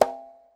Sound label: reels stop; Beauty Fruity; wazdan